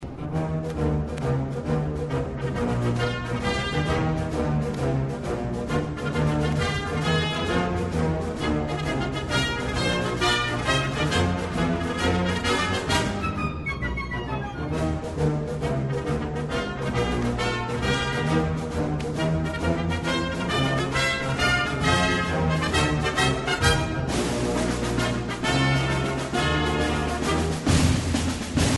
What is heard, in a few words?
swing; School Song; WinsideWildcats